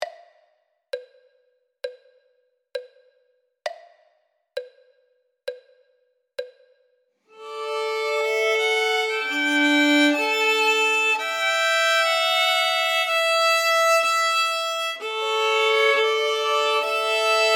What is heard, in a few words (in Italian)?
violino I e II